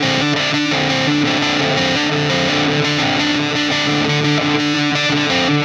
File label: Static FX Guitar; Sonic Foundry (Sony Creative Software); Indie Rock Anthems; Session 13 - Static